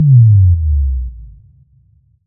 Sub Kick 110Bpm, House; Ghosthack Advent Calendar; House Kit; House Construction Kit

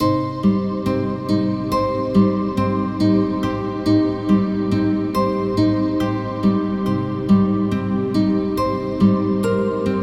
Acc Guitar Outro - Cm 70Bpm; Construction Kits; STEMS; Ghosthack; SkyRealm; Kit 19 - Ode To Daenerys

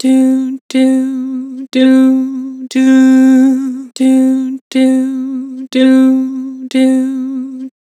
Vibrato "Doo", Vocals; Harmor Vocals

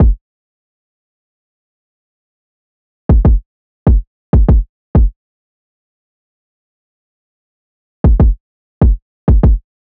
Drum Loop - 97 BPM Kick; Loop Stems; Cymatics; Vibes II Drum Loop Collection; Waves

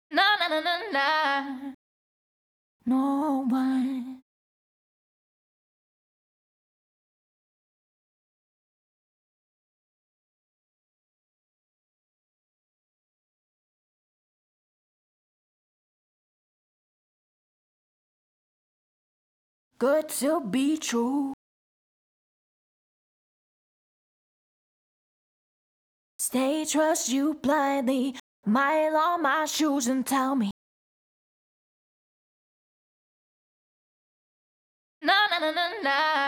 CLEAN DUP R (AbMaj), Pop Vocals, Acapellas; Ghosthack; Ultimate Pop Vocals; Heart Break

